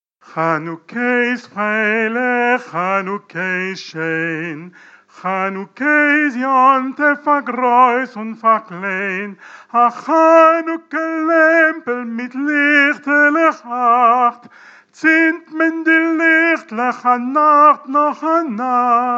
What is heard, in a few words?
Chanuke iz freylekh soprano chanté